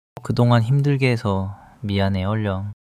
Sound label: crying